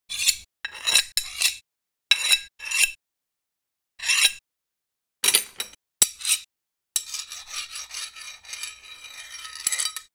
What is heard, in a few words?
scraping